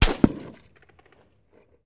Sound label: Gun Shot Sngl Left